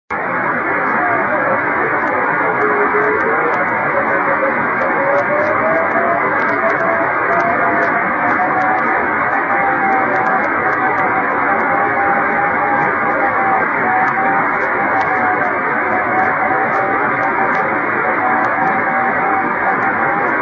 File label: 24G beacon; うすぐもり、気温３０℃ 湿度７５％; SSB:RST519 ANT: 60cm dish; JH1UGF 横浜市; JN1AYV 茅ヶ崎市